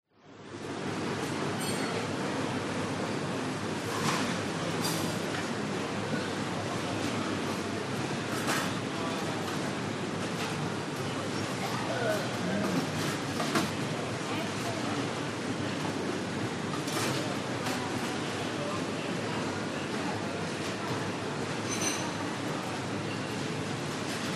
restaurant food court ambience med busy people cutlery hawker centre singapore